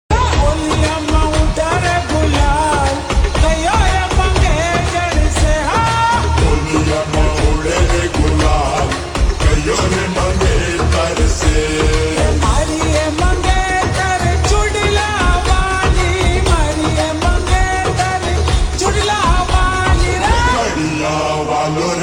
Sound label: Slowed Reverb Ringtone